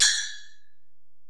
Ac Perc, Drum Machines Alesis DM-PRO

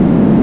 WIND; sound canvas

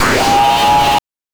BANK2 SFX, NES DPCM; MIDINES

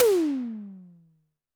TD-20 Tom A, Roland TD-20 Toms

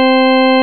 SOLO ORGAN; Zero-G; Funky Elements

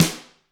GRETSCH SNR, Double Platinum Drums

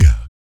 VOC PERC, Vocal Planet Jazz FX BASS PERC

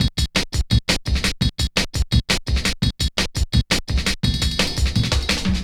Break, Drum & Bass, Beats & Breaks; Fluid Dynamics

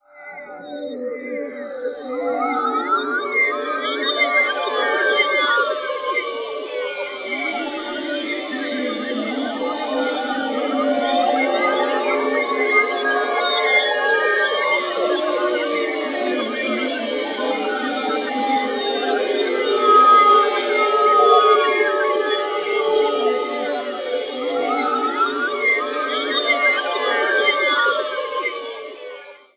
strange sirens; transmissions

